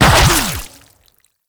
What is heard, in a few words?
Laser Discs Proj Return Shot; Infested Lich; DJ Rom